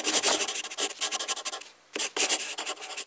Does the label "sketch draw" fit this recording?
Yes